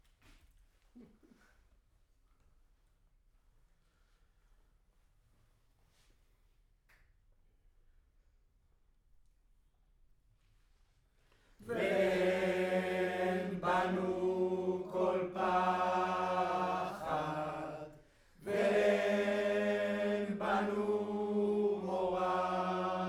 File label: Room, Choir